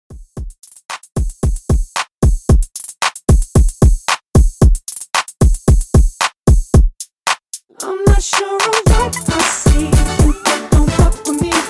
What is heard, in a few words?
Redrum 113Bpm